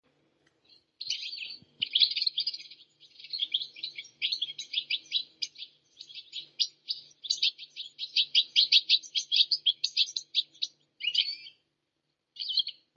Chardonneret Elegant

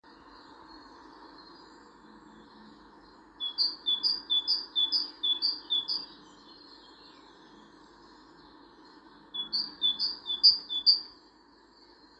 Mesange charbonniere

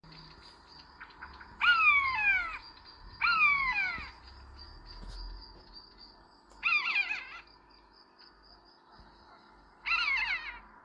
Buse variable

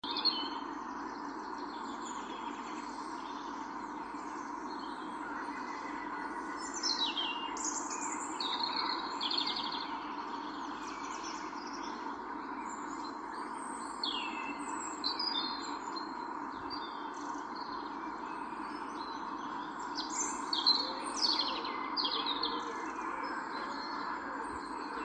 Rougegorge familier

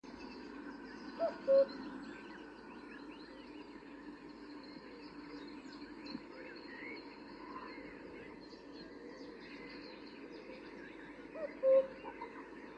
Coucou gris

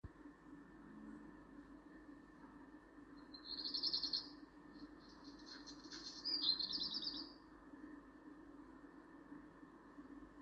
Rougequeue a front blanc

